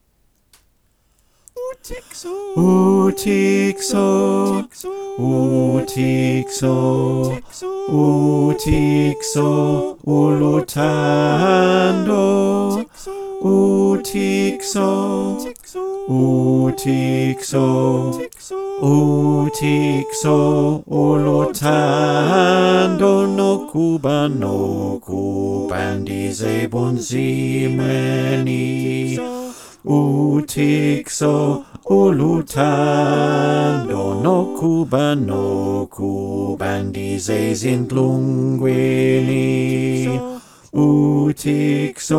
bas

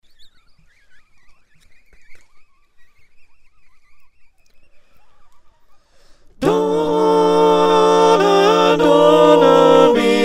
SATB

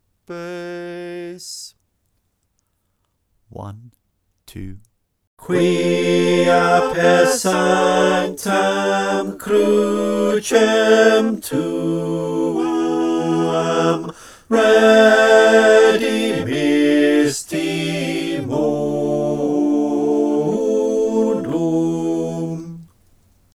Adoramus Te bas guide

